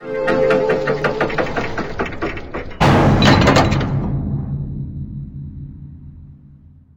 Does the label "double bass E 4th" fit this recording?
No